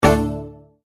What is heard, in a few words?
Multimedia Tone-Stop; Tone Sound Effects; Tones (Sound Effects:Sound Design); McKinney Sound